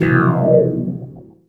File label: shield wait; Earth and Beyond; EA Games